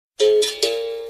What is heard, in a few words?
berimbau